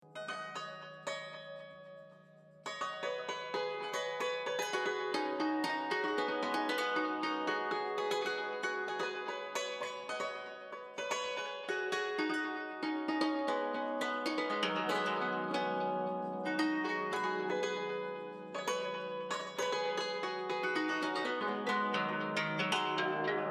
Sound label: koto solo